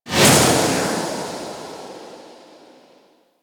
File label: Ghost Spawn; M3 Void Arena; Warframe; Wraith Quest